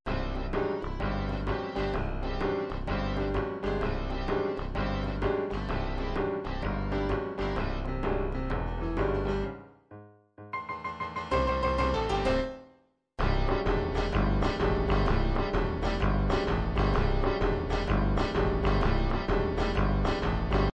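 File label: country 2 step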